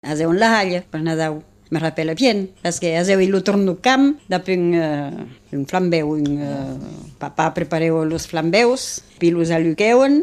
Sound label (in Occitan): halha nadau; noel bazadais